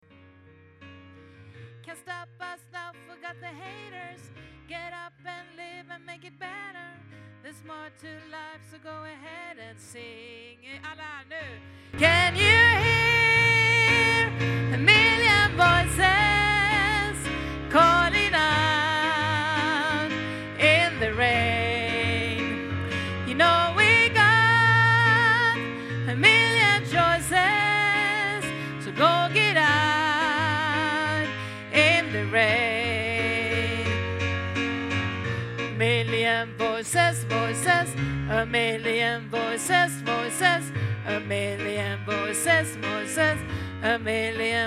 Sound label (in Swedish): voices sopran, stämmor